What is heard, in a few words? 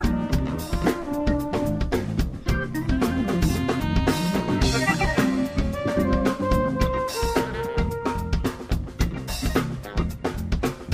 Improv Jam